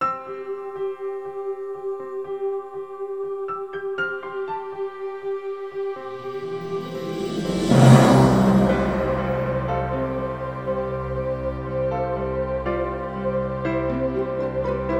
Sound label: music insert for mid-video